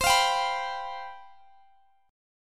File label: C Major 7th Suspended 4th, Guitar, Standard; CM7sus4; strummed; x,x,22,22,20,19; down; capo=9